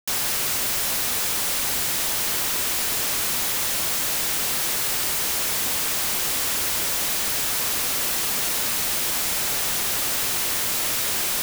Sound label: white noise